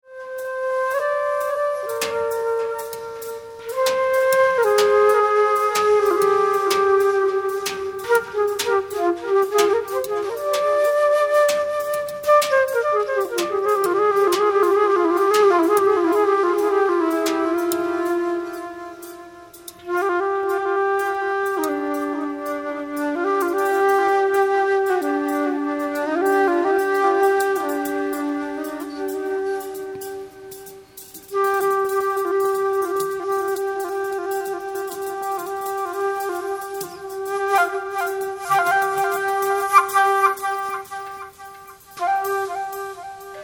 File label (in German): flutorgie